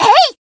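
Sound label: attack, Japanese; suzette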